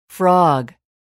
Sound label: frog